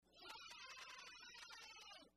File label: Yaaay Kids Shout